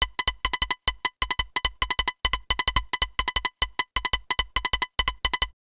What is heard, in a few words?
D&B Synth Riff; neuro